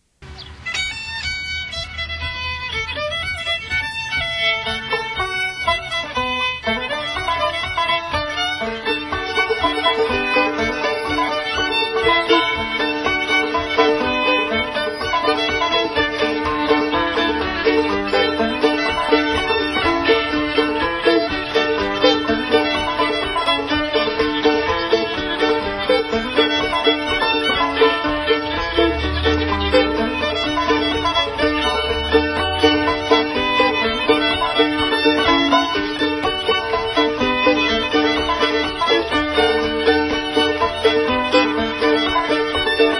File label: fiddle, mando, banjo/clogging; recorded live; Spotted Pony